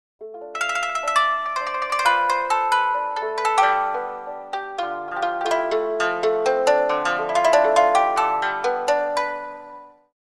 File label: koto